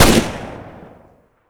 tommy shoot; tf2classic

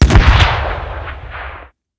air burster explode, weapons; tf2classic

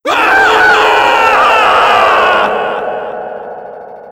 Gladiator Scream; tf2classic

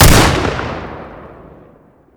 trench shoot; tf2classic

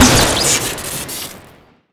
chemflare shoot crit; tf2classic

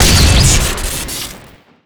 widow maker shot crit; tf2classic

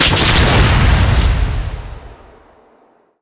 dragons fury shoot crit; tf2classic

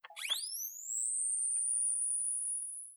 defib start; tf2classic

